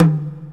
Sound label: Fixed Battle no loop, SNES; Cyber Knight